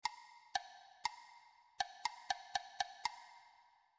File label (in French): rythme